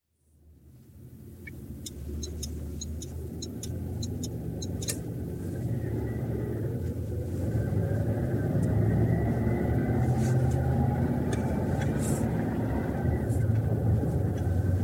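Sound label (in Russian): звуки поворотников у автомобиля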